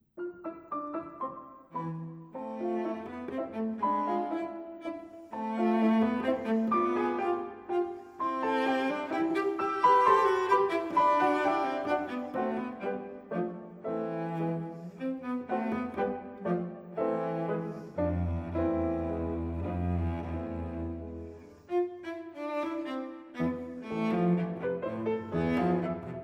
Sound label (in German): Sonate Allegretto quasi Menuetto; Brahms; op 38